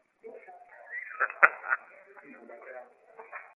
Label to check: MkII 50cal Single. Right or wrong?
Wrong